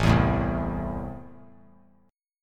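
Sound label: A Minor, chord strum; Am strummed; JGuitar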